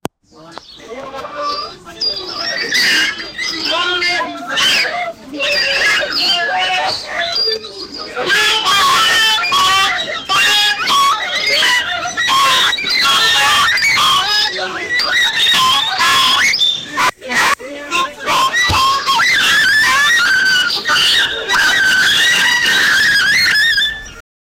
the pigs are screaming an

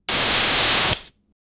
latch clunk and sharp bur, air burst

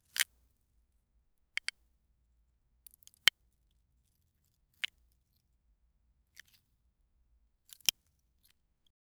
realistic asmr sound of a, detached

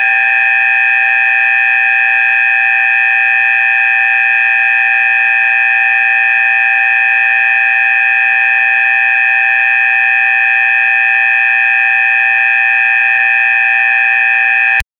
atomic station safety ala, safety

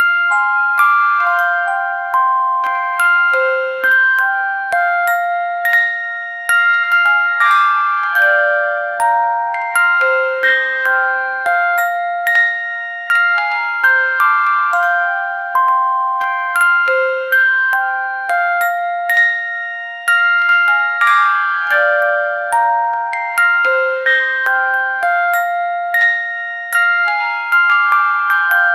calm sleepy baby music

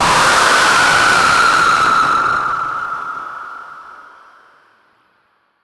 creapy ghost jumpscre; apparition

